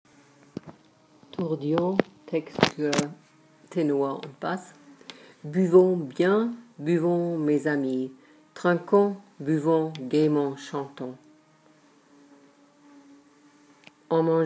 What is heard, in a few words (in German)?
Tourdions Text Tenor und Bass; singen